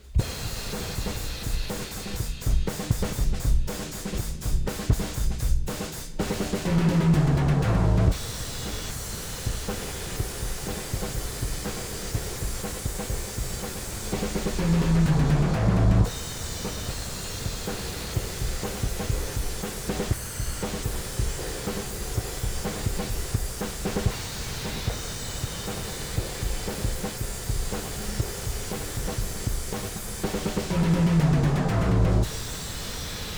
Bus Processor THRESHOLD 25.0 dB MAKE UP GAIN 8.00 dB SATURATION 10.00 TONE SHIFT TREBLE